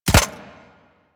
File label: Arachnoid Micro Land; Warframe; Corpus